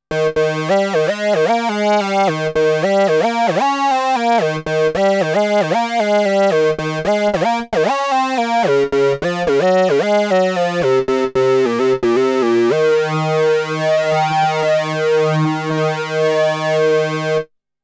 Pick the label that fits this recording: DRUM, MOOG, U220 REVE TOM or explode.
MOOG